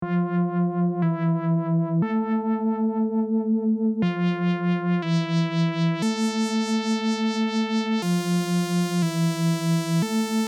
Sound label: KARP SEQ